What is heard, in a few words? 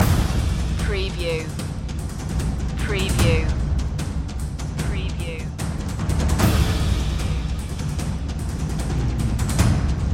forged in fire percussion loop